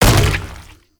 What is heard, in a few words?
Mushroom Bounce Impact; Warframe; Nokko